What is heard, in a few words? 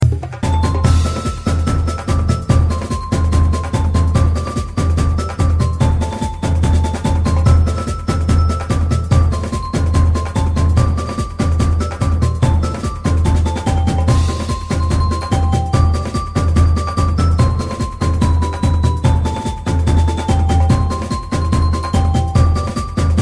sinulog beat; ringtones